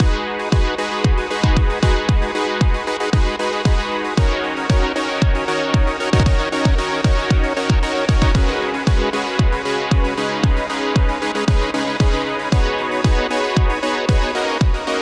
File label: supersaw